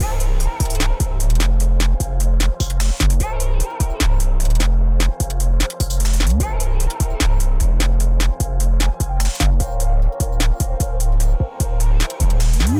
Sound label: Excessive Low End